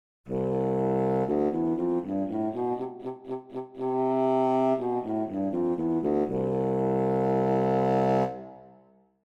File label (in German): Fagott